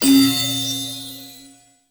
Receive Buff; Warframe; The New War; Narmer; Grineer

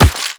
Run Rock, Footsteps; Warframe; The New War; Characters; Kahl

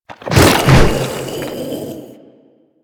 Infested Ancient Strike Flesh; Warframe

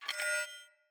Sword Whoosh Accent; Weapons; Player; Swords; Garren Sword

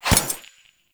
Glass Shatter Damage Hit; Warframe

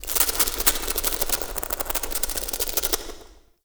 Ambience Subway Mice; Nineteen Ninety Nine

